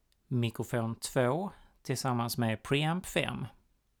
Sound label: mic pre